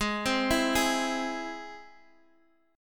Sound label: C Augmented, chord